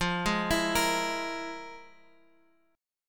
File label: F Minor Major 7th Double Flat 5th, F, Guitar, Open F; FmM7bb5; arpeggiated; 17,15,16,17,x,x